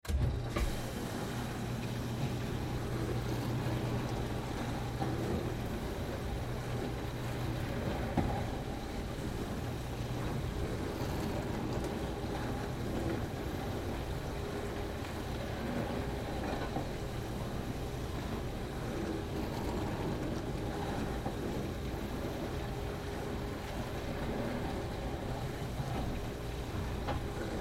Dishwasher Rinse, Dishwasher Rinse Cycle On Run Off; Whirlpool Appliance; Household; Blastwave